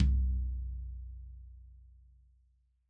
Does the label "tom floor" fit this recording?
Yes